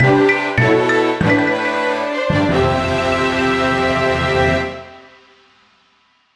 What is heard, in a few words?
Jingle Lose